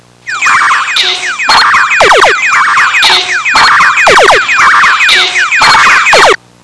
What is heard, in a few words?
PINBALL